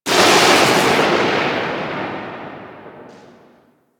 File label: Electricity Blast; Warframe; Ambience; Nineteen Ninety Nine